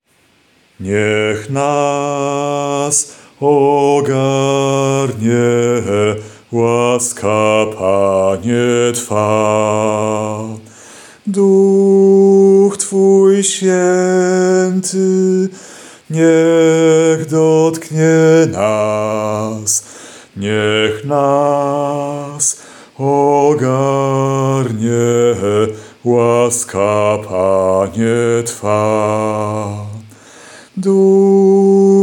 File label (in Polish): Bas; Niech nas ogarnie; laska panie twa laska pana